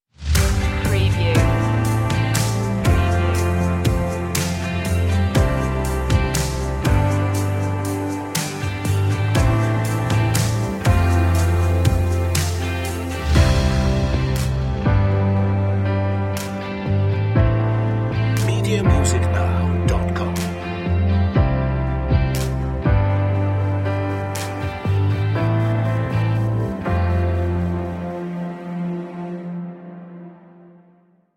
no euphonium, pop ballad

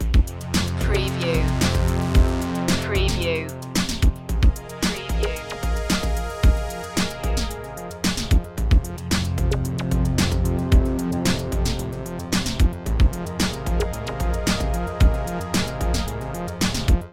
recharging loop, Ambient Rhythmic Textures

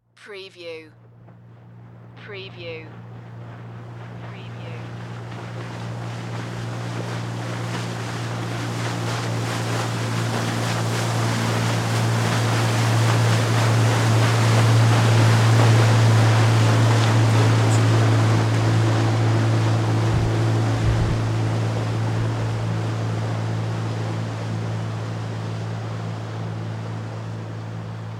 Jet Ski Pass By Slow; Sound Effects Bible

